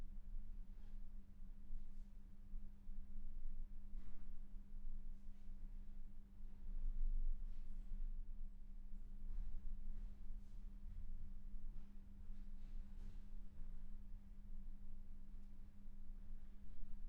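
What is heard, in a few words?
Vocal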